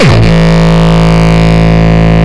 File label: Longkick, kicks